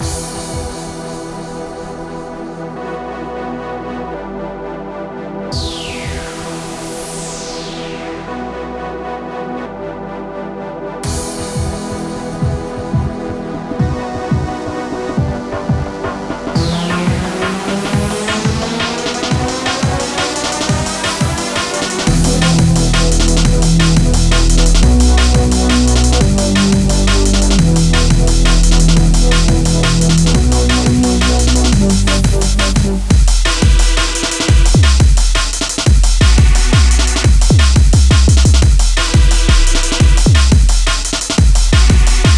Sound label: bass music dnb